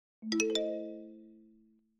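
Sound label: virgule xylophone marimba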